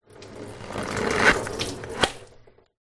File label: household squeeze mop squeeze wet drips